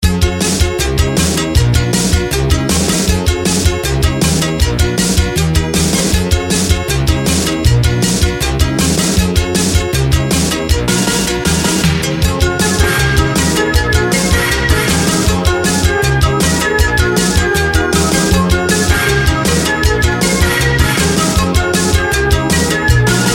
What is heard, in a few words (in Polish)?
Instrumental Miłości; Dzwonki na telefon